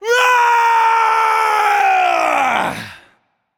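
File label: non verbal battlecry, voice; cernunnos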